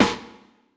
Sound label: hiphop snare, Effect on